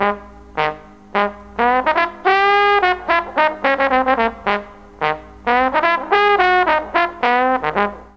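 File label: C4 D BONE, mellotron trombone; DIXIE+TRMBN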